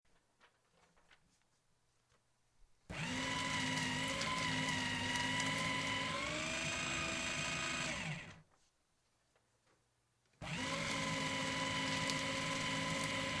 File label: paper shredder